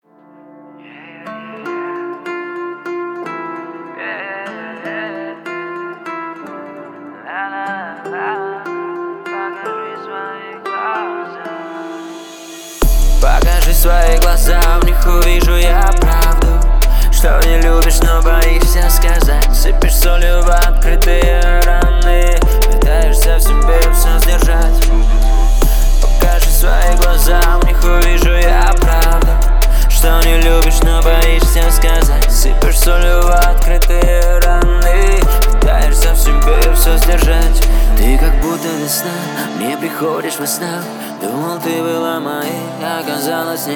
33-37hz, Low bass

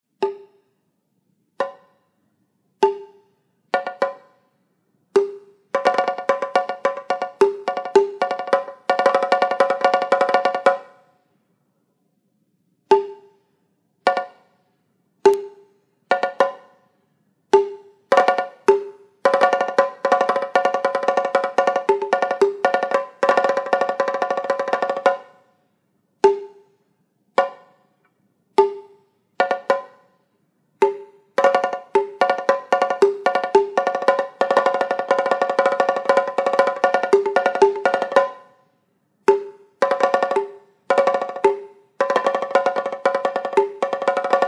Bongo

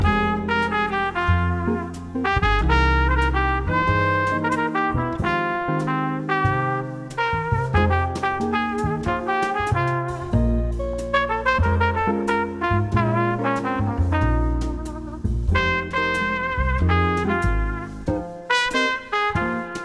jazz

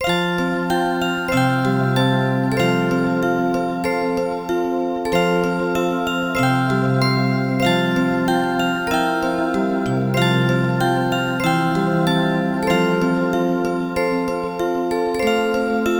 Orgel

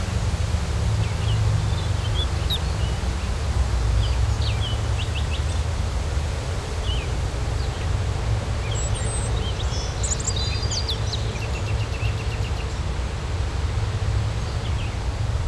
White Noise

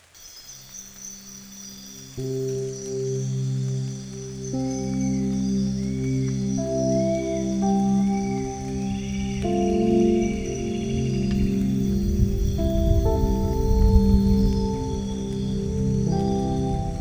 Relaxing Spa Music Chill Out

